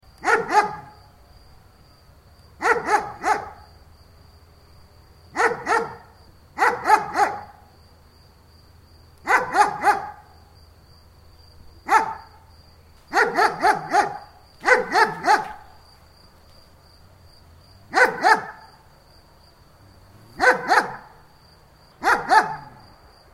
dog barking sound german shepherd

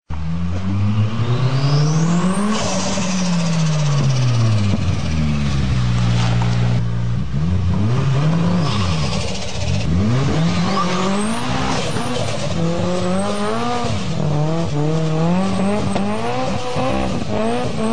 turbo car

